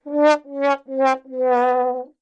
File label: sad trombone